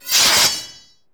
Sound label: SE GAME SLIDE; maimai